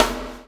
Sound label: CHAIN; Spectrasonics - Backbeat